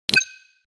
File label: Digital click